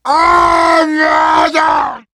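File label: pain critical death; Heavy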